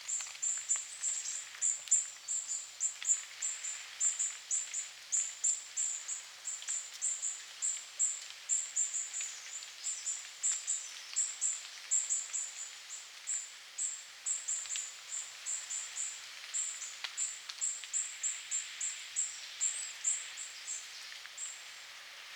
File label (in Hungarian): meggyvago Coccothraustes coccothraustes